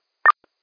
MDC-1200 DOS; MDC DOS - Data Operated Squelch is a feature on Motorola radios & repeaters that acts to block most of the sound of the MDC burst

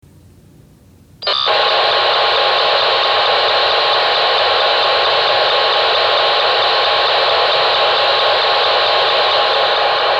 EDACS Aegis; Harris Aegis; This was the first-generation digital voice solution from GE.

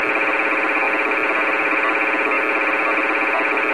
EDACS Narrowband Control; Harris EDACS Narrowband Control Channel; This is a very seldom seen variant of the standard EDACS system.; Verona Twp. on UHF; Trump Plaza Casino on 900 MHz

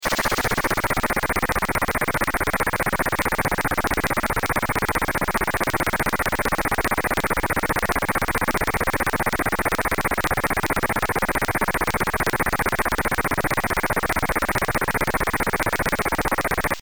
DMR; MotoTRBO Enhanced Privacy Digital Encryption; in the raw

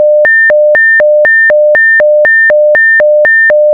Harris Emergency Call; Harris Emergency Call Tone; This is the sound modern Harris radios will make when a user initiates an emergency call.

